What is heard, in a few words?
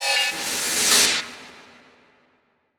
Transition Laser Horn; Production Element